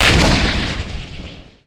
tank cannon

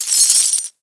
sickle and chain

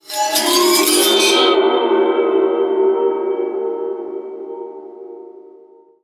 spooky, anime

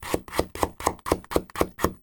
chopping carrots with knife

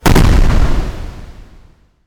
giant monster collapsing

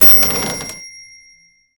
opening cash register

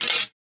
preparing to use a sword

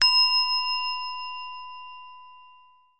being disheartened

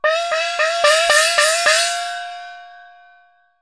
chinese effect

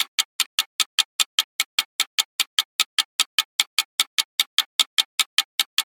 clock second hand

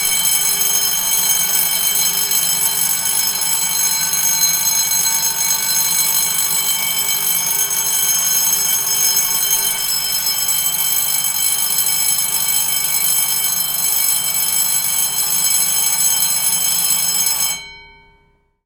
warning signal

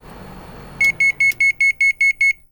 microwave heating complete

